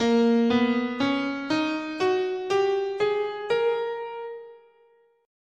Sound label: A sharp Symmetrical, scale